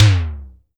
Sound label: SIMMONS SD-5 drums